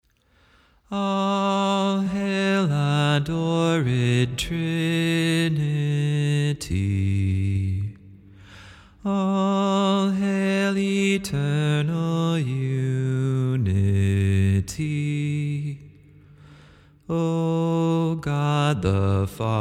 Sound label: Bass, vocal